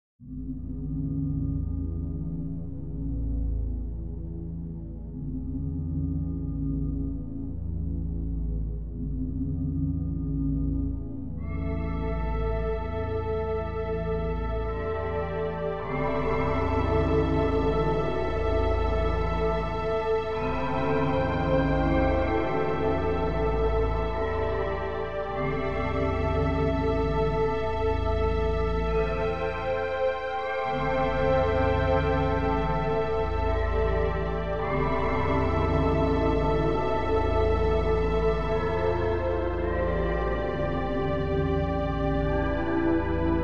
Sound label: Vox Concrete Wavestation Korg